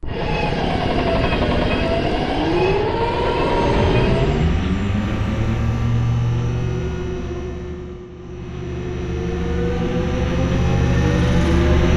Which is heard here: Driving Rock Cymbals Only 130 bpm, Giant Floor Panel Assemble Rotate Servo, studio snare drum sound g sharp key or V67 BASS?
Giant Floor Panel Assemble Rotate Servo